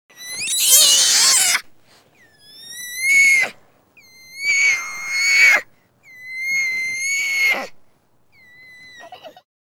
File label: crying Monkey Voice